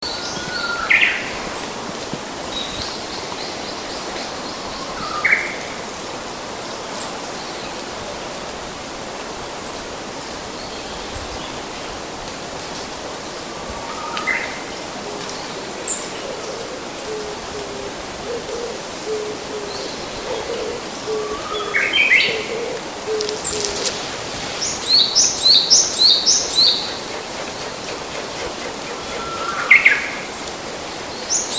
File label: uguisu & sijyukara